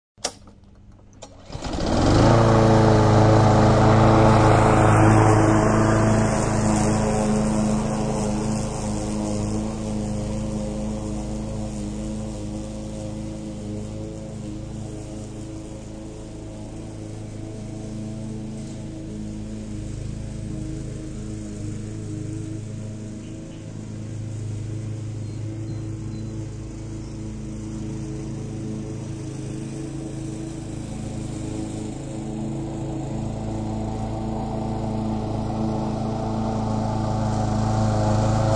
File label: Lwn Msr Strt Mw, Lawn mower is started and then mowed around the yard; Lawn mower is started and then mowed around the yard and back again.; Power Tools